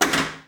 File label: menu; Digital Paintball 2